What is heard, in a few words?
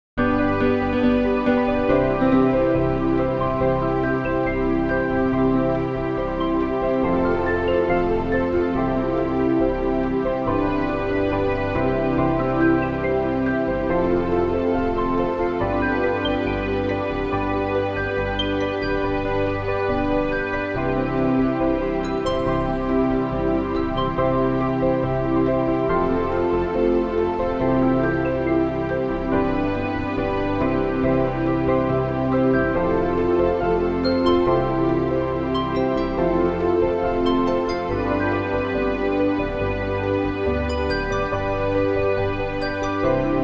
backing; New Hope Music